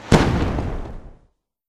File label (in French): boum